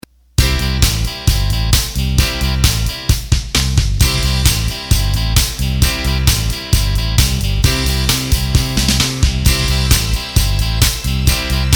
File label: Disco; roland bk7m